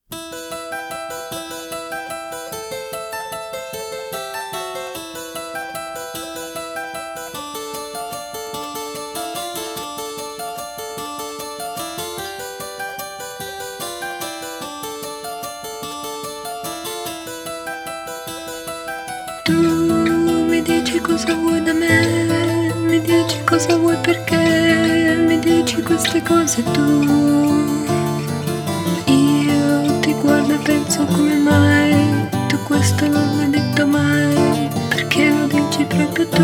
introduzione in do maggiore instrumental

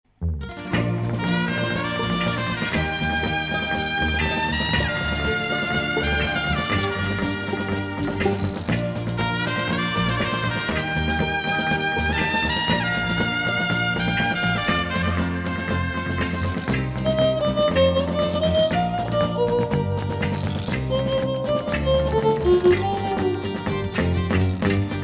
galoppata trombe e chitarra

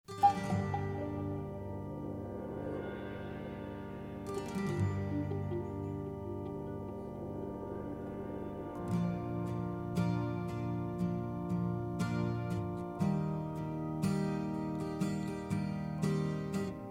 galoppata organe e chitarra

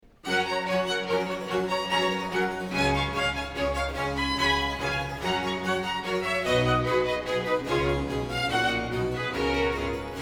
coro

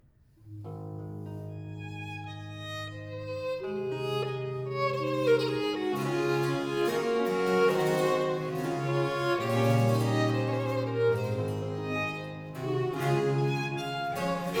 sonata violino solo adagio; heinrich von biber; representativa

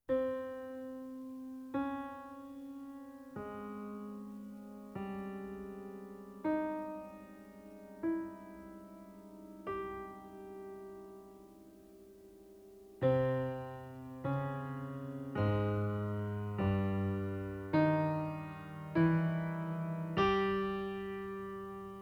il fantasma suona il piano

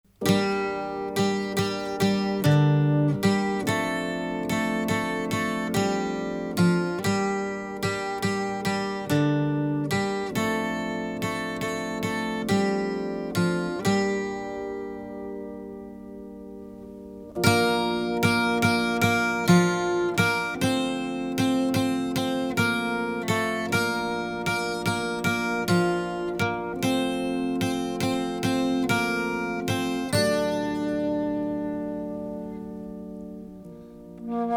chitarra e organo

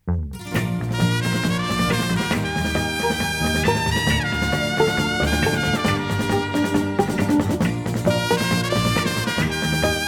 galoppata trombe e chitarra

